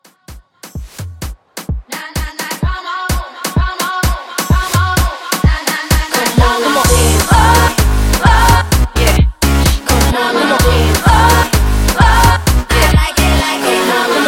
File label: Afro 128